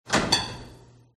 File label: open door oven; fox audio